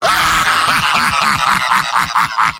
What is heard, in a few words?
yell; heavy; mvm